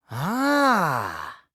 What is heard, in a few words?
ahhh; kokichi